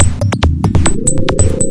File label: 140 bpm, space whoop analog beat, drum loops and breaks